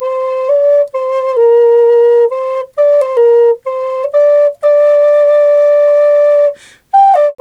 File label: G 130, FLU RIFFS, Didgeridoo and Other Primitive Instruments; Big Fish Audio - Didgeridoo and Other Primitive Instruments